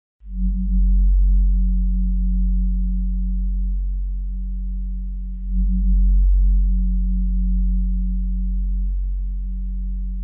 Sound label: ambience, dark ambient